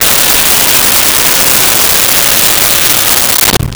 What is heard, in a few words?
Raspberry, Comedy Vocals; SONY PICTURES SOUND EFFECTS SERIES; Vintage Cartoon